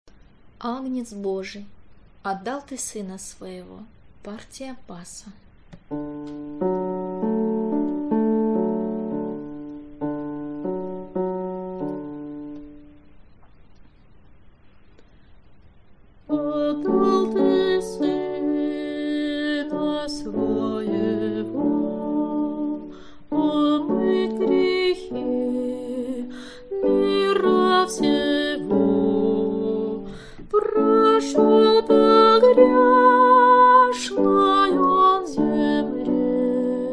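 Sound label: bass; Agnec Bozhij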